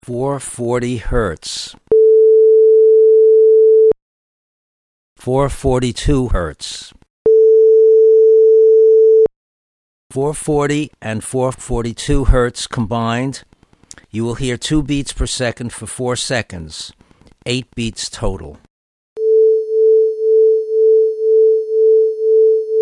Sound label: Beats